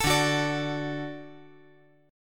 D Major D down

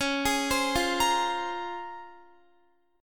Db Major 13th, Guitar; arpeggiated; JGuitar

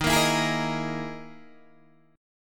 E Major 7th Flat 5th

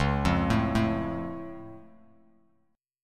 C sharp Augmented down Bass Standard, chord; arpeggiated; JGuitar

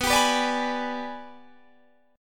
Ab Minor Major 7th Flat 5th

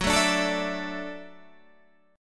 F sharp Minor 7th Sharp 5th

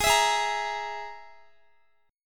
G sharp Suspended 2nd Sharp 5th, Guitar; strummed

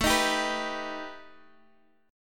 B Augmented 7th A

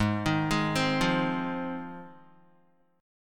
Ab 6th, Guitar; arpeggiated; JGuitar

